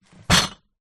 foley bottles glass empty in cardboard box set down